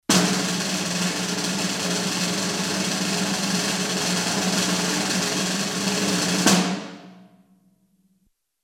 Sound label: Drum Roll